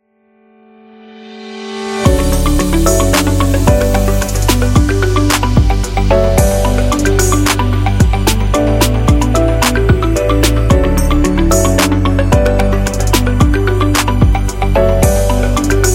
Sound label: Technology Background